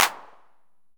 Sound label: 909 CLAPS